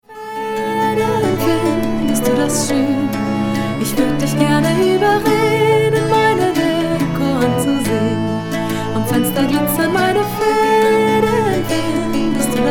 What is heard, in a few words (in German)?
Schnabelwetzer